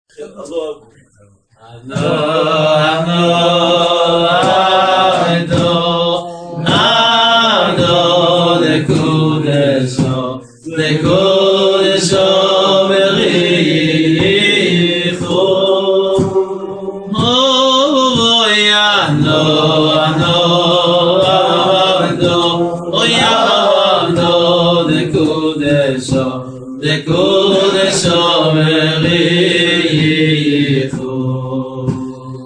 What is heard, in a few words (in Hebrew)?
שיר